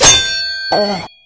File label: vice; tool; Nuclear Tech